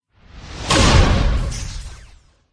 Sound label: mage buff